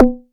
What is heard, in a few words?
Perc; EFM